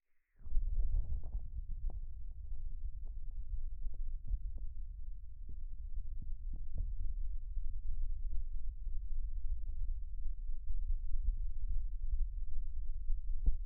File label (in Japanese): 強く耳塞ぎ L; 3DIO; 耳かきに関する音